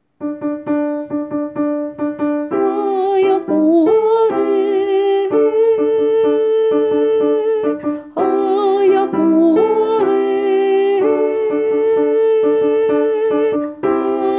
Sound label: Soprano